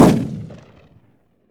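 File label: shotgun exterior shot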